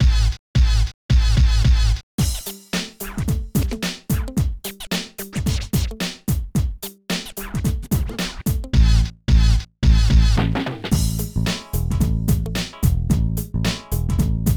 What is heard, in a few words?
Hip-Hop Stage Karaoke; Pinky Street Kira Kira Music Hour Pop Princess; Hip-Hop Stage 3